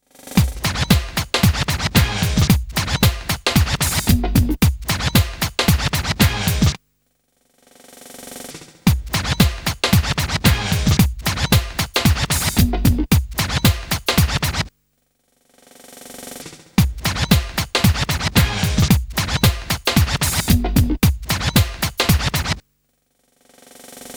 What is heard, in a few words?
stutter scratch loop